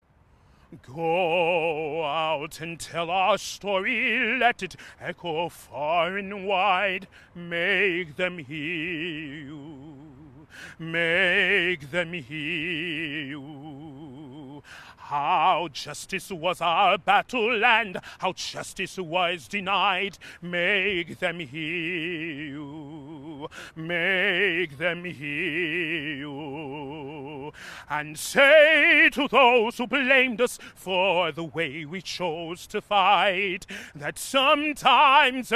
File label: D.C. Mall live stream audiio